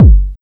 127 DRM, Fresh Disco House

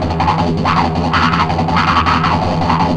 Riff, Industrial, Nasty

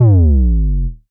C Bass, DnB One Shots

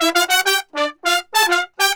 BRASS SALSA; Best Service ProSamples vol.14; AKAI

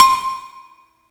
80s Drum Ago; Yamaha PSR E305

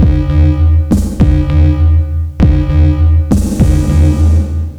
nuclear alarm, 100bpm

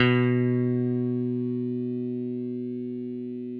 6STR BASS; Sampleheads; Whole Lotta Country 1 & 2

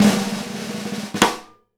snare roll hit; E-MU Producer Series Vol. 8 – Platinum Phatt; Live Snares

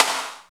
SNR, Tekno Industrial; East West

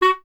clarinet F#, Solo Instruments; Miroslav Vitous - Solo Instruments VOL-1; 90 s Sample CDs

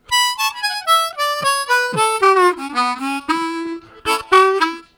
Going Down, Harmonica Riffs; Zero G - Harmonica

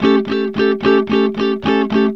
ROCK A 110, Vintage Blues Guitar; USB Soundscan vol.22; AKAI

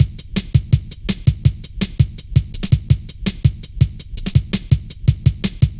light stepz, drum loops

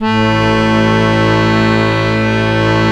ACCORDION A1; USB Soundscan vol.63; Balkanish Traditions; AKAI

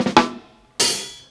FILL DRUM; USB Soundscan vol.27; FILLACOUS

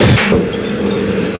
WAREHOUSE

